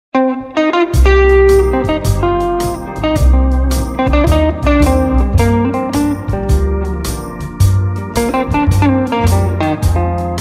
Guitar Solo

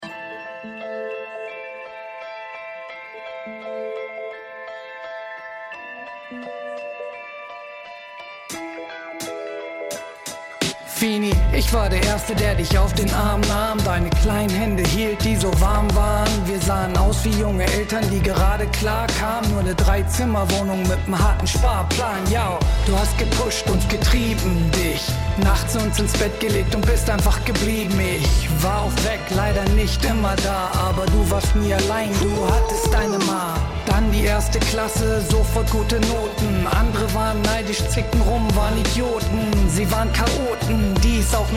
Hip Hop Mix

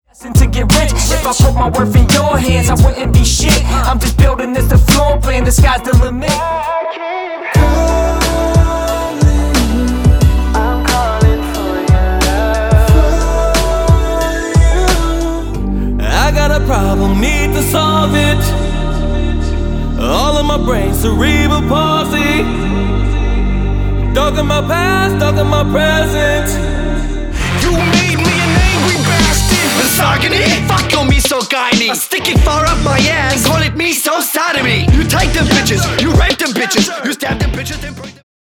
Hip-Hop, Rap, RnB

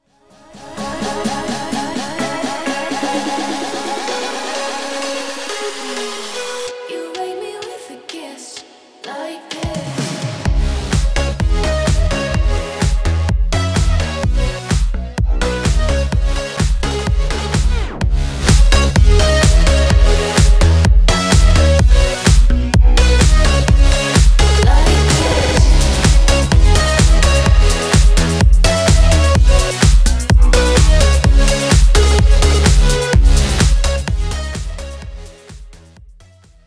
edm

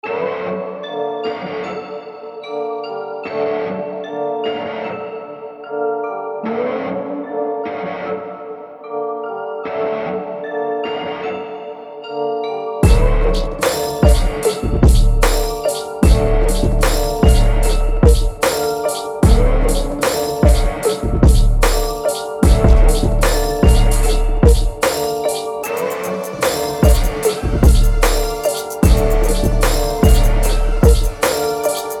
K-TRAP 150BPM Dm INSTRU

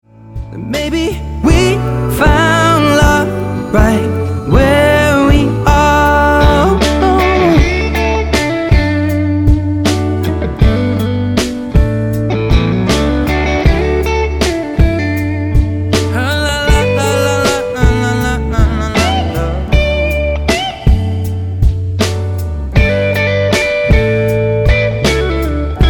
Guitar

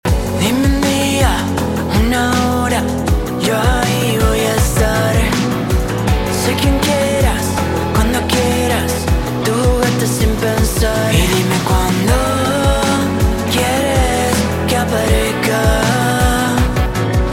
pop vocals

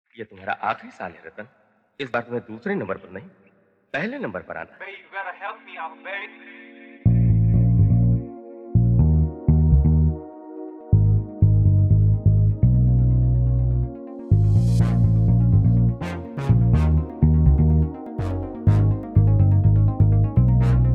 Disco Remake

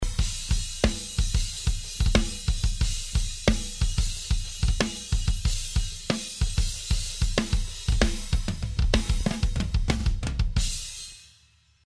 Drum Editing Sample

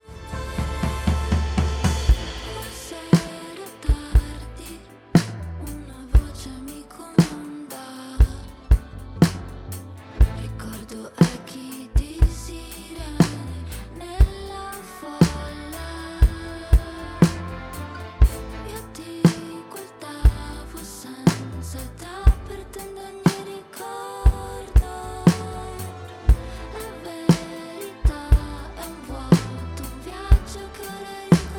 Pop Sample

